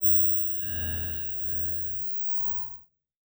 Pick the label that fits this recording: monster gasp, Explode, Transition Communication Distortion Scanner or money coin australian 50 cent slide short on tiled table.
Transition Communication Distortion Scanner